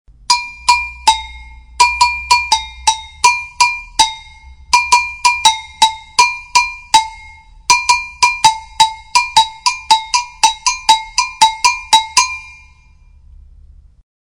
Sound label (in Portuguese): Agogo